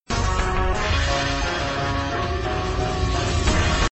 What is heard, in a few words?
slowed negative 50